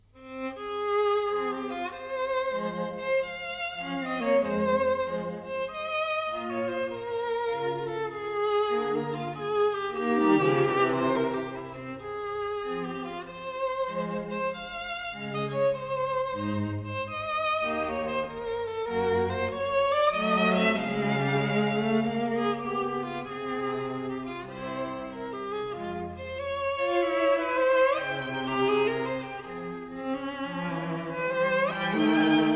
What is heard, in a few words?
string trio